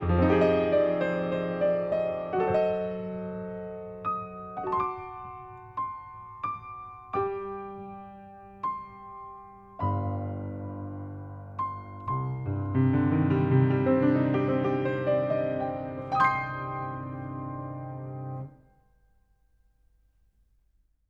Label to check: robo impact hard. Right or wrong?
Wrong